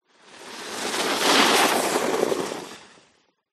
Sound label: Ski By